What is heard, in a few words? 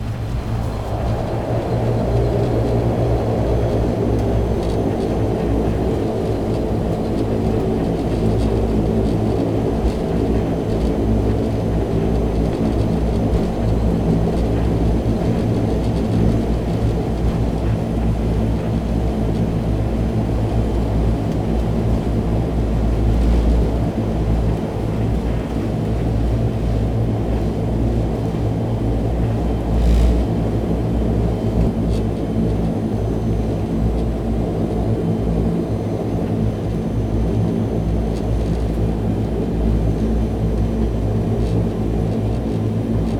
airplane interior propeller; best service Blue Box